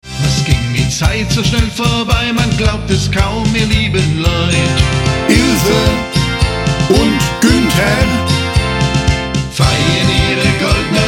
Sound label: Polka